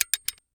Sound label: gear; tortuga